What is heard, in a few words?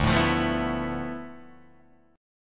D Minor 6th Add 9th D, Guitar, Open D